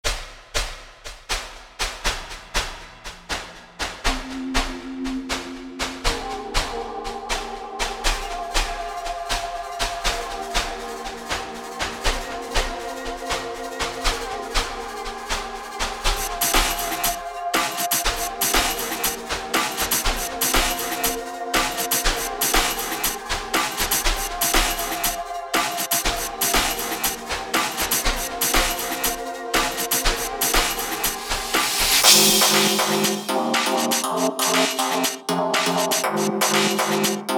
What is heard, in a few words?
nightmare world instrumental